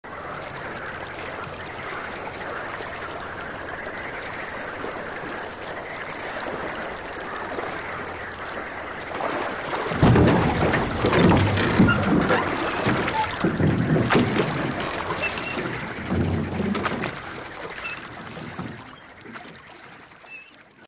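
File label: boat dock, ambience stereo; mohaa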